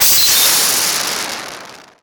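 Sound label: evaporate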